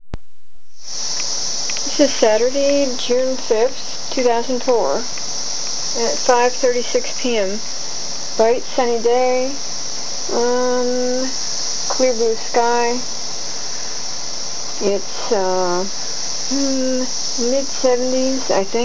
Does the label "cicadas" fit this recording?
Yes